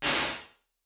Medium Rooms; Effects; Convolution; IR Samples; NI Kontakt 5

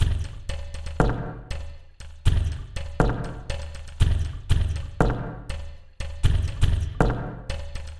Bathtub & Ice Kit; Drum Racks; Ableton; Factory Packs; Lost and Found

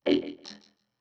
Neo-Wave Vocoder, Pop Rock

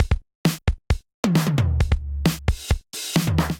DMX Kit groove 133 bpm; MIDI Clips; DMX Admission Kit groove; Ableton; Factory Packs; Drum Essentials

